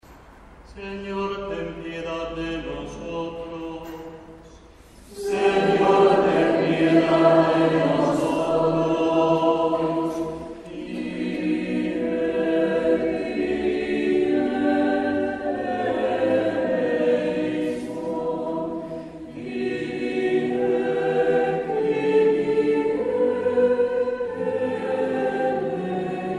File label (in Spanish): kyrie menor